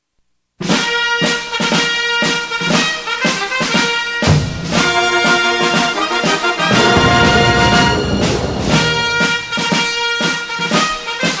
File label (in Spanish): HIMNO NACIONAL INSTRUMENTAL; MUSICA POLITICA